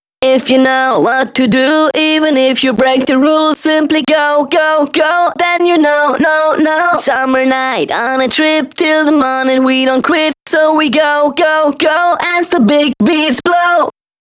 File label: Pitched Vocals DRY, 140 BPM; Vengeance Samples; Vocal-Hook Kits; Song Kit; If You Know